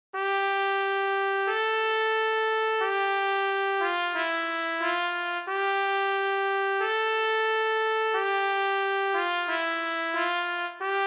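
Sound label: solo Trumpet; O du fröhliche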